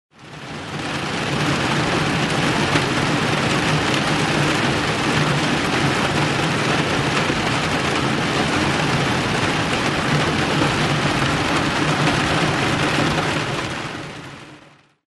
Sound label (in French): Pluie ds la voiture